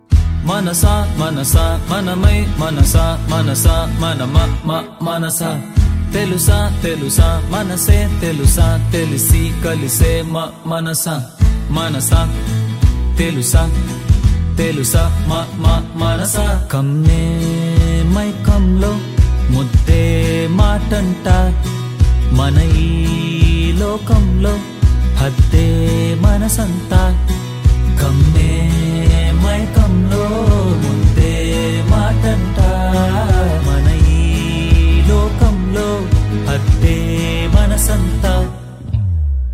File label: Male Version Telugu Ringtone